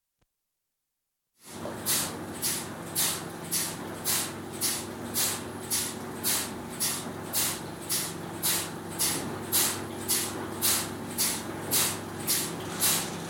Milking Parlour, interior, cattle being milked & moving in & out of parlour; Cow Sound Effects; BBC